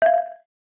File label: electric etc, keytone; SKY IM-A800S-VEGA-LTE